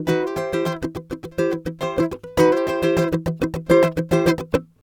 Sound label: Funk